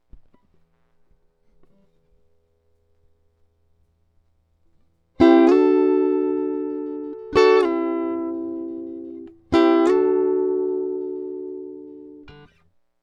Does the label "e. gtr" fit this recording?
Yes